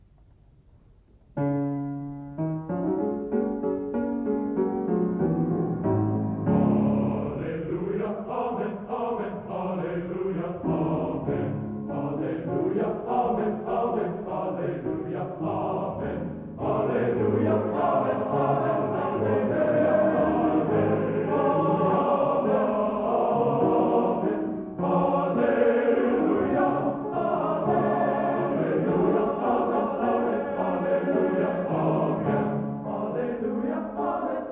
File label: Alleluia Amen, Recording of Hallelujah, Amen by the; South Bend